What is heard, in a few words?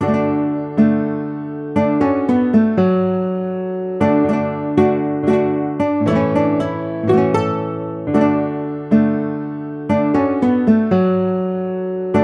Guitar